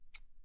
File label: Scissors Shears; UNUSUAL KITS and FX